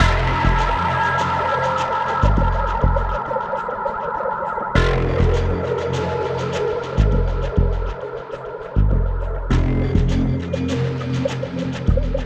suspense loop

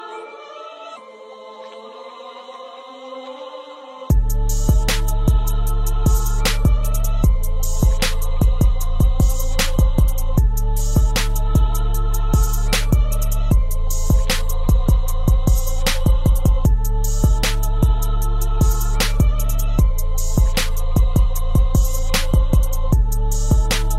beat

